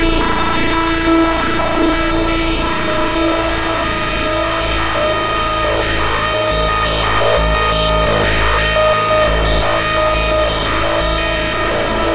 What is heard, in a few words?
nasty loop; brainpipe